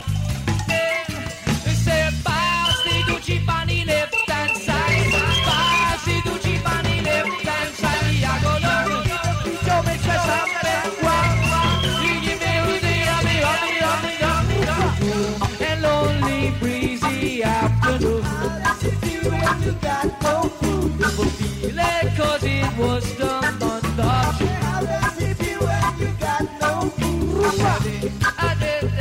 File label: reggae medley ringtone